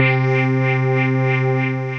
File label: Wobbly Chord, synth keys; Wavetable; Ableton; Synth Essentials